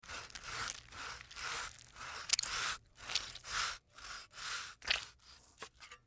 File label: MedicalScientific; Soundfx III